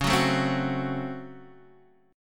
Db Major 7th Suspended 4th Sharp 5th Db down Guitar Half Step Down